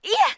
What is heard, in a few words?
attack, monster; bkboss